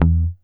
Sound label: GTR TRIK, Jazzistic; Univers Sons